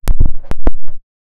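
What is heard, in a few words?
electronic microphone mute, button press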